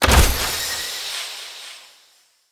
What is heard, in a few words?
Bow Ranged Shot Arrow Rain; Weapons; Player; Bows; Grand Father Bow